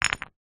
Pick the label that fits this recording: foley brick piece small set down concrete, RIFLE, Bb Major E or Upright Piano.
foley brick piece small set down concrete